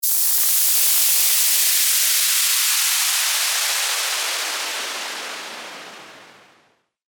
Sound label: WHOOSH